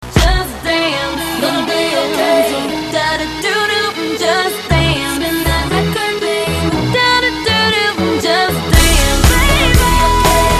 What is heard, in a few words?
win